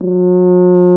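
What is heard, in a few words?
BRASS FRENCH HORN 3; Roland LA; ORCHESTRA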